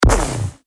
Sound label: particle canon